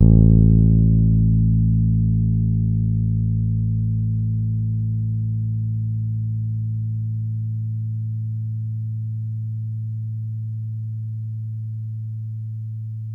As A2 hard, Bass, Pedulla Thunderbass; Giga Samples Collection

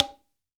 BONGO

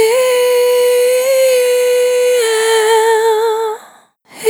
Single Voice Melodic Loops, Vocal, Dry; Sony Creative Software; Pocket Diva World Class Vocal Samples; Discovery

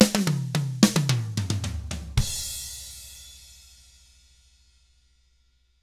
POP, Drums

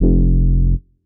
bass classic blurt F; Polyend; ARC Noise

